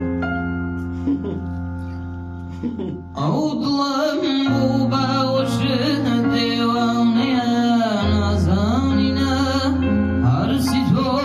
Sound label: kordi ba gitar; kordi ghmgin